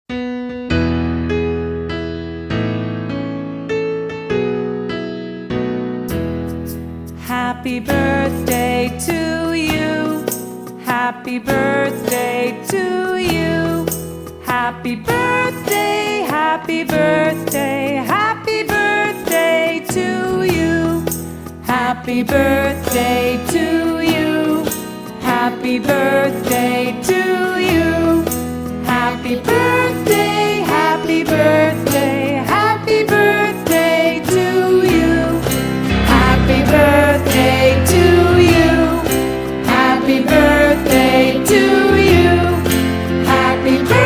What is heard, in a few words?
happy birthday to you kids